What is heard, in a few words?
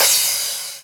Cymbals Crash; Vengeance Samples